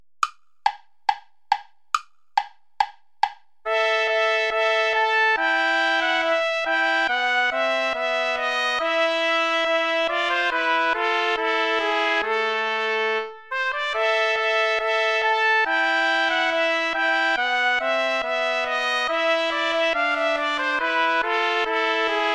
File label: allemande play along without trumpet